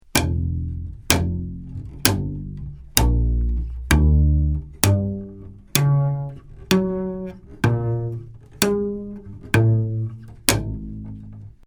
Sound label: Upright Bass Slap